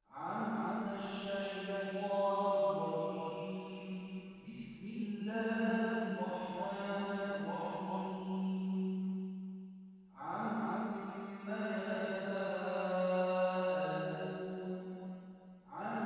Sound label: ambience church